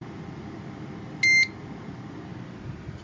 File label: msi motherboard beep sound